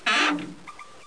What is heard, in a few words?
door open